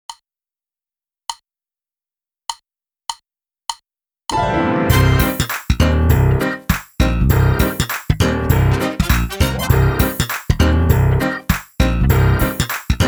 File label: drumless